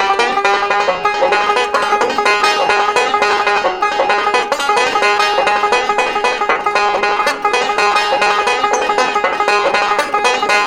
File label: banjo music fast; bumpkins